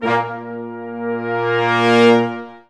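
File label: Brass Ensemble sfz; Roland L-CD702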